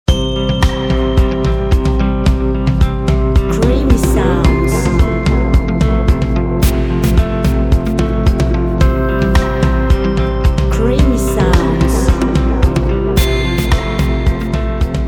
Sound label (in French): creation musicale planant